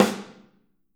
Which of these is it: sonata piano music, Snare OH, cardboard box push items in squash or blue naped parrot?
Snare OH